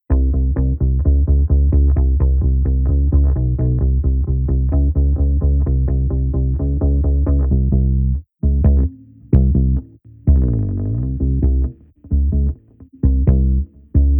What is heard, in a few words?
Lowenhance on Bass Off